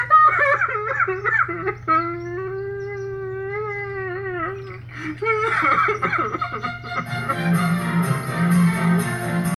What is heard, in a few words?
crying